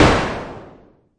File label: mbrute noiz, Arturia MiniBrute; DRUM MACHINES